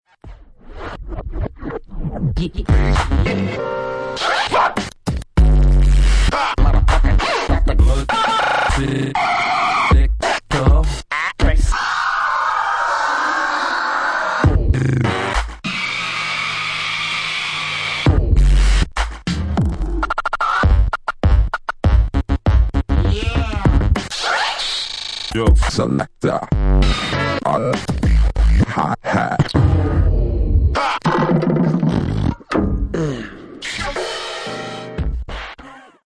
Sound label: Instrumental